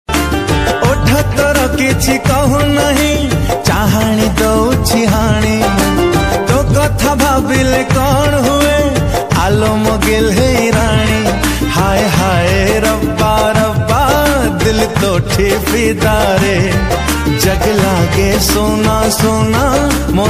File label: Odia Song Ringtone